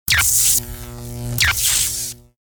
electricity; Garry's Mod; omnidroid 16

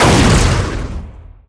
explosion normal; weapons; cow mangler; tf2

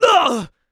shove; Garry's Mod; tf2

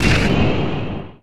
magic missile; skibidi wizard

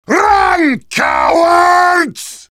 battle cry; heavy; Garry's Mod; tf2 content

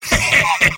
sniper laugh short; vo; tf2; mvm

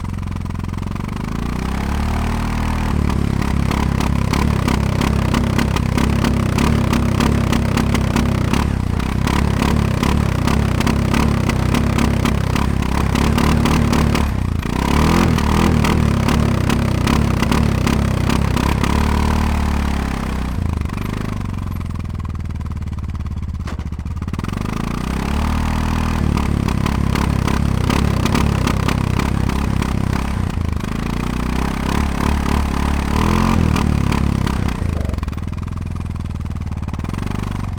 move bike; motor sahur

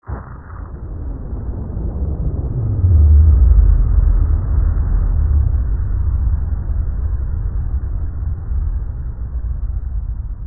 citadel outside fx, ambient, city; Garry's Mod

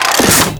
reload; cow mangler

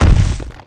walk; tiny

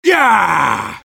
sniper cheers

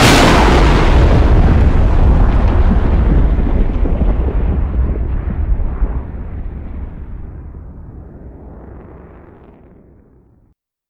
sonic boom; kolmods proj impact

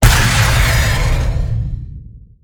rocket launcher; weapons; tf2

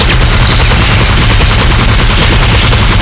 gun motor loop crit; weapons; dragon; garrysmod; tf2

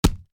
heavy taunt chest hit; vo; taunts; tf2

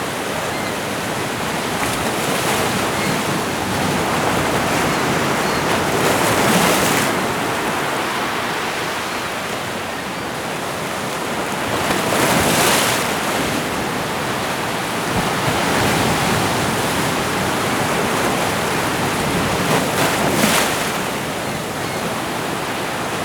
warehouse harbor, ambient; Garry's Mod; tf2; mvm warehouse

